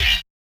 Yamaha DJX 2B, Hit

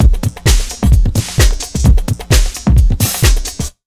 JUMP 130, Big Beat Drumloops; USB Soundscan vol.25; AKAI

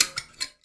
Finger Click; Battery 3 Sample Kits

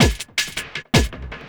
Loop, Drum & Bass, 160 BPM

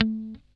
GUI A3, E-Gui Muted; Sound & Vision; Gigapack I CD 2

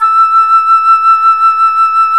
FLT FLUTE, Flt vib; Roland L-CDX-03 Disk 1

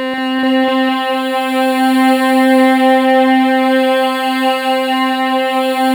BIG PPG, OLD PPG; Sound Gallery - Charged

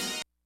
HITS BITS; Kid Nepro - Elite

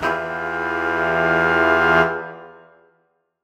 Horn Swell Emajminb6; undercover samples

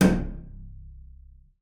BING C1, BASS FINGER; Xsample VOL-5

